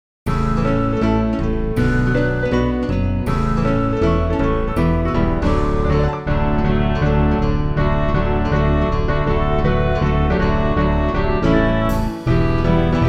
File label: instrumental; New Hope Music